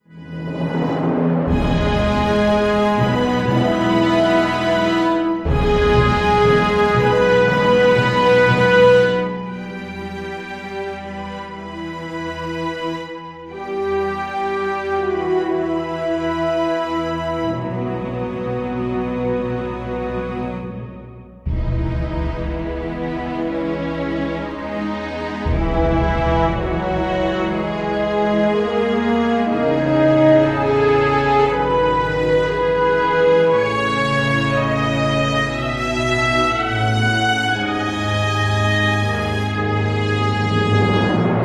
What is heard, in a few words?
Desolation, No Choir